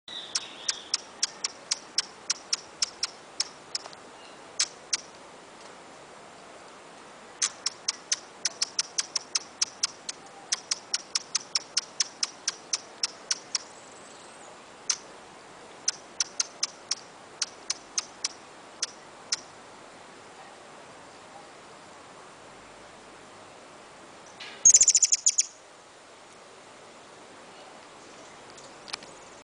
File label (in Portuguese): Beija-flor